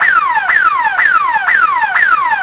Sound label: car alarm; auto; Tony Hawk's Project 8; XBOX